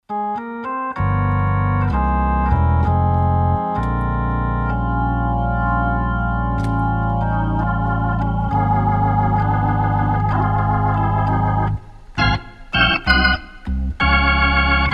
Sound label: 3300w Gospel, hammond organ